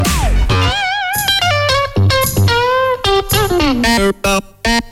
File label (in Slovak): gitara solo plus beap